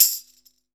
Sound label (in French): TAMBOURIN; Univers Sons; Basicussions